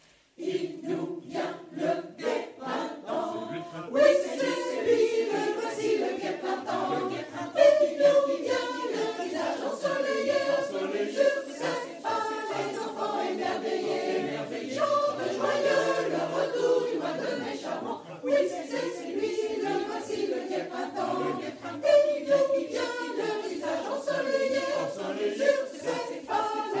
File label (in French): extrait fanfare